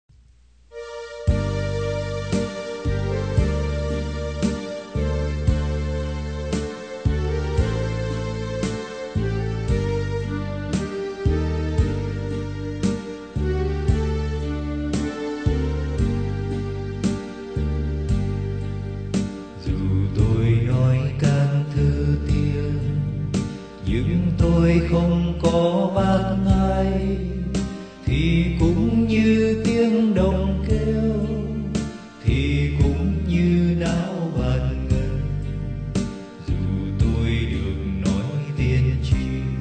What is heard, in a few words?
BASS